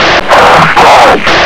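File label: zombie grenade attack; svencoop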